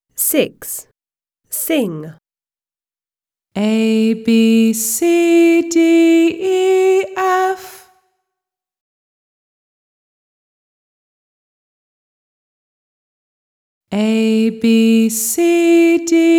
song ABCDEF, British; My alphabet book; Smart Book 1

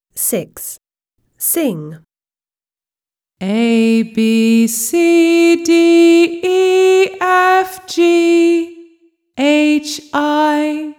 song ABCDEFGHI, British; My alphabet book; Smart Book 1

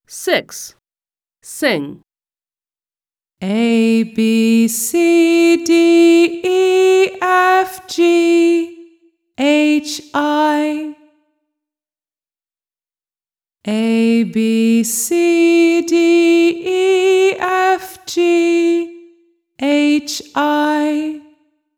song ABCDEFGHI, American; My alphabet book; Smart Book 1

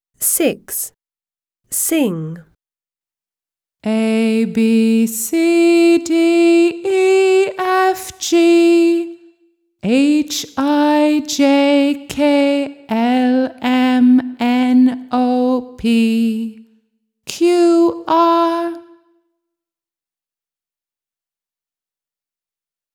song ABCDEFGHIJKLMNOPQR; British; My alphabet book; Smart Book 1

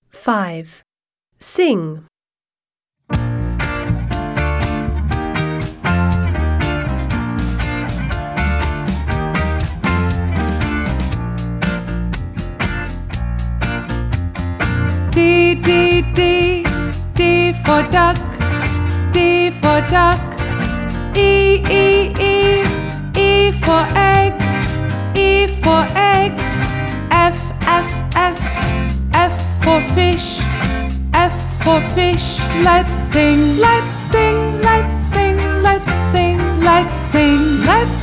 British song D to F; My alphabet book; Smart Book 1

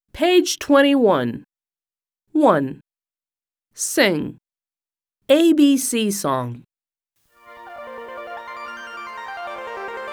American song ABC song; My alphabet book; Smart Book 1

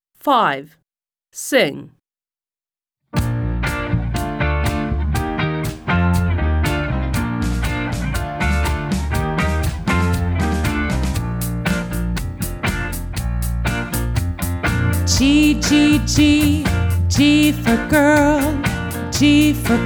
song G to I; American; My alphabet book; Smart Book 1

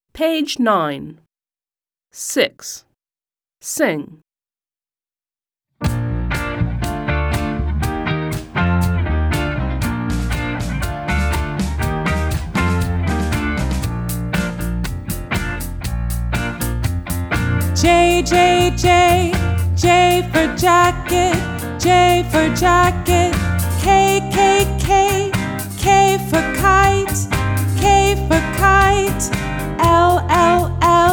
song J to L; American; My alphabet book; Smart Book 1

